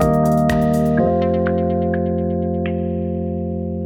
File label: Rhodes; Pads